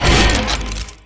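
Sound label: skate unlock gap; Tony Hawk's Project 8; XBOX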